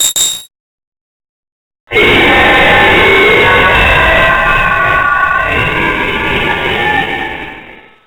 leap; slender; zerosiki

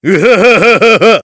heavy laugh er big snort

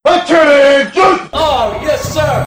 proxy spawn; billy herrington; slender

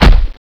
footstep sound; zerosiki; slender

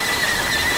static; slender; insanity

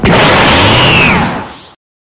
powerup; freak fortress 2; buff doge; ssj

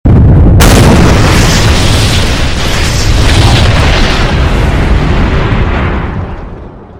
explosion; nuke

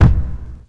granny footsteps; zerosiki; slender

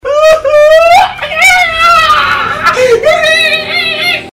eeee

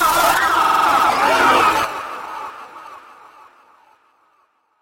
damaged; missingteam

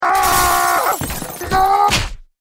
heavy; hoseong

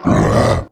attack, male zombie; nmrih; zerosiki; slender

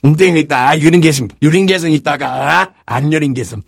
engineer positive vocalization

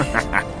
laugh; freak fortress 2; armstrong

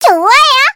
laugh evil; voice packs

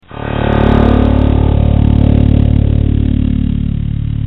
funny friend despawn; slender; zerosiki

